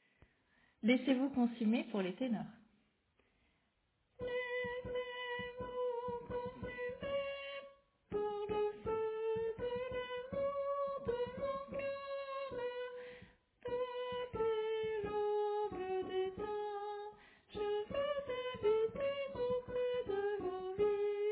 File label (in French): Tenor